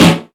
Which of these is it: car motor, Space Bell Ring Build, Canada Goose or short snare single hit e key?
short snare single hit e key